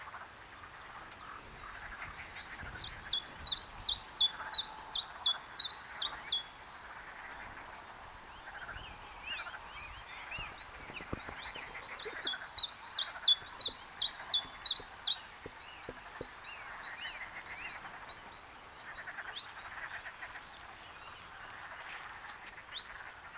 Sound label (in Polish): pierwiosnek Phylloscopus collybita